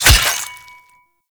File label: Melee Axe Impact Flesh; Weapons; Impacts; Axes